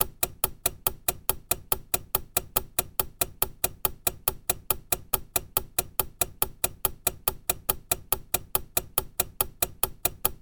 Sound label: warfare bomb ticking countdown